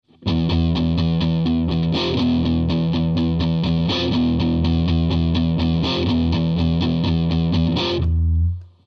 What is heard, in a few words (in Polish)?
riff akcent na 4 i, gitara